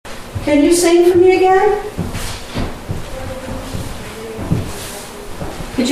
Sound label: singing